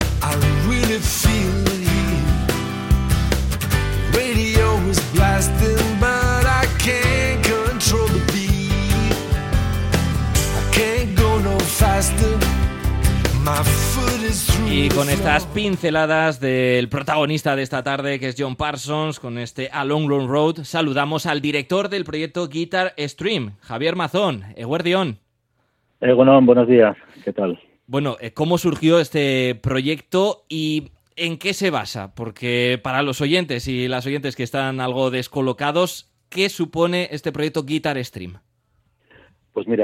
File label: guitar stream